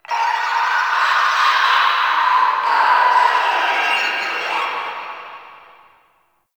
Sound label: Exterior Foreground Infested B; Warframe; Ambience; NineteenNinetyNine